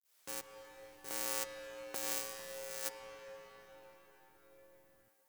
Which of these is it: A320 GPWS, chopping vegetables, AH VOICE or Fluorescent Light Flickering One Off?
Fluorescent Light Flickering One Off